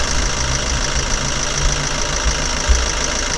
opel vectra, Vehicles

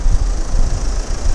renault megane, Vehicles

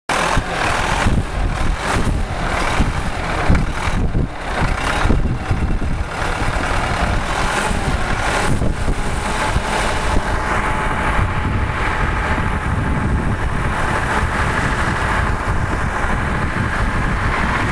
Tractor U650, heavy cars

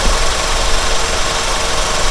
seat, Vehicles

